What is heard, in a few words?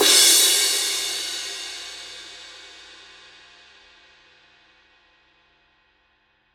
signature dark energy 19 dark energy crash mark i edge